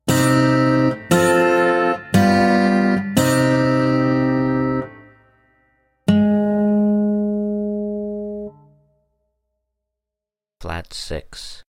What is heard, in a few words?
Flat 6 Slide Lap Steel Guitar Minor; ear training instrumental color